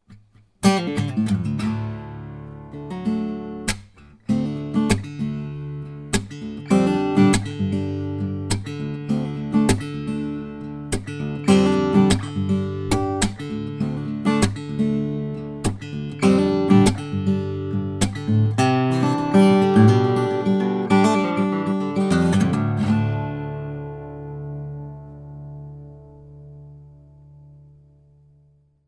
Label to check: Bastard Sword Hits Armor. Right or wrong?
Wrong